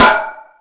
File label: All Snares, E-MU Pro-Cussion; drum machine